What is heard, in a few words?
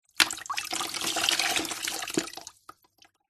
household mop wring out plastic bucket; zapsplat